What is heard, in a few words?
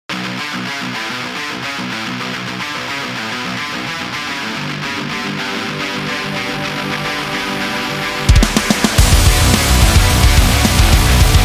Metalcore